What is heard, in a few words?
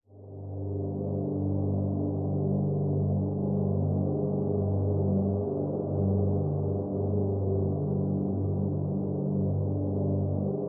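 Drone Wind Farm Simple G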